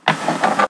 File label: se open